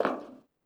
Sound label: Char Fs Walk Metal; We Happy Few